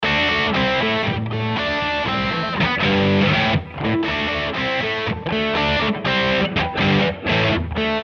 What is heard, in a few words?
120 Verse Rock Solid A